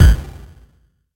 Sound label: explosion; todee and todpee